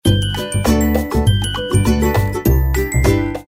Rainbow Notification Tone; Vivo

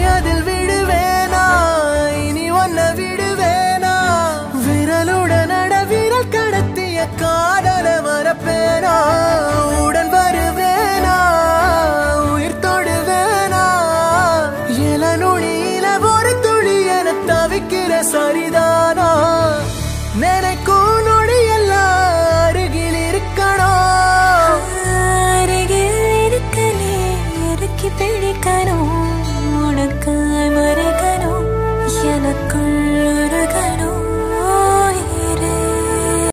Tamil Song Ringtone

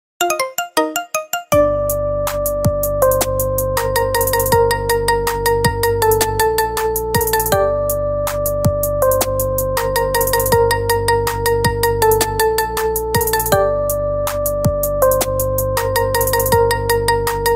marimba ringtone

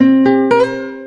Huawei SMS Tone

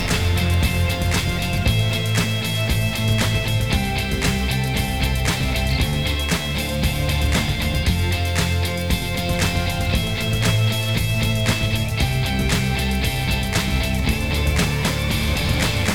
Ringtone; Huawei P20 Pro

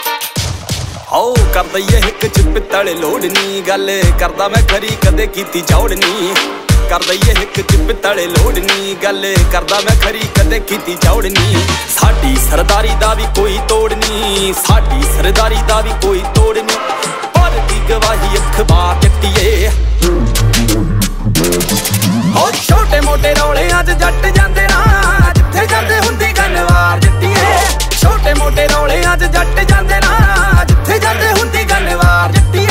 Punjabi Song Ringtone

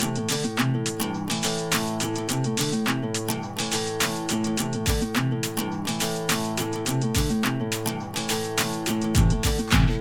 Huawei Digital Ringtone

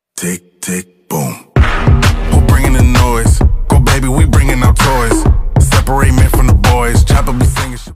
Beats Up Ringtone

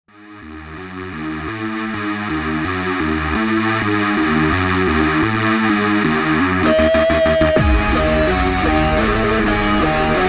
Action intro Scene Ringtone

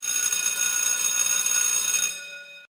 School Bell Notification

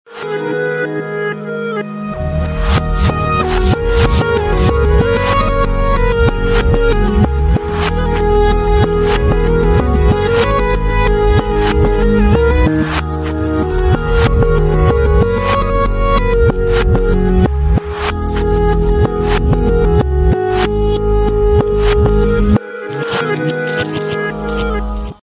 Romantic Samsung Ringtone

